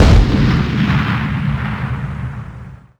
turret aa missile explosion far

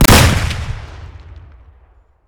mr43 doublefire close; Escape from Tarkov

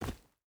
footstep barefoot forest jump land; Rust

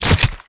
connect flesh

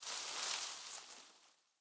leaf rustle crunchy hard

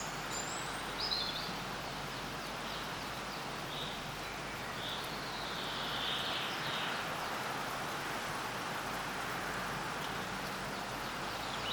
amb forest; War Thunder; ambient tanks

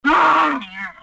Camel Die Vox; Civ4 warlords

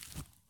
soil jump; Escape from Tarkov

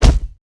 Hit Knight; Civ4 warlords

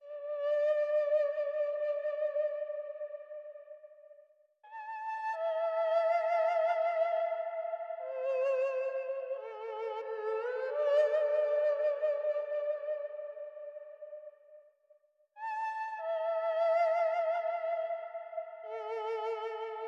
music interactive; World of Tanks

